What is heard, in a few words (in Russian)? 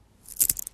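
звуковой эффект щелчка ветки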